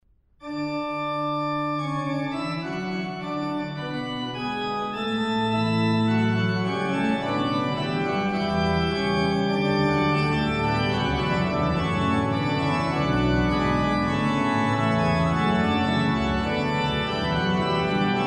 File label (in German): Fuge C Dur; J. S. Bach